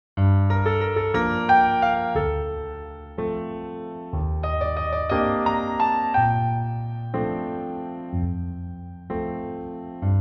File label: Piano instrumentals